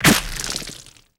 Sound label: splat, explosions